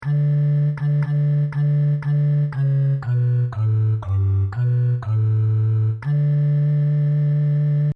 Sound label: Bass; Allelulia